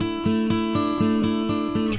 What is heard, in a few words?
acoustic guitar picking; GUITAR LOOPS